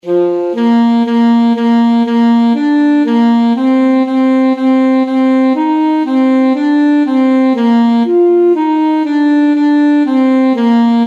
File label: alto saxophone; molly malone